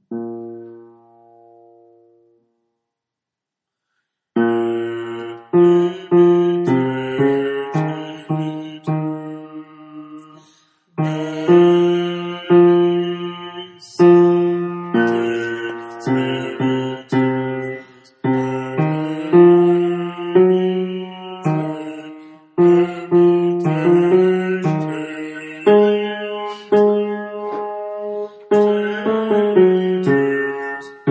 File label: Bass only; Veni Sancte